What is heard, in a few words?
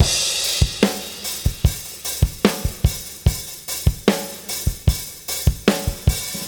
Beat Loops; Zero-G; Monster Beats